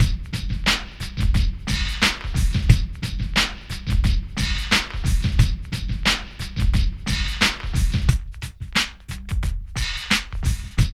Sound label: PHAT LOOP; E-Lab; X Static Goldmine 2